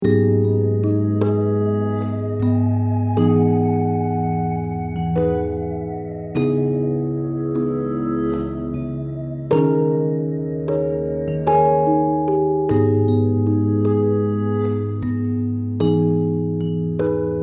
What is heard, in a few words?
Melancholy Melody